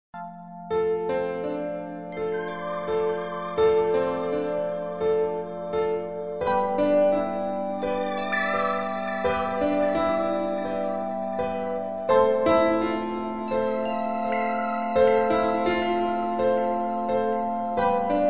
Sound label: intro piano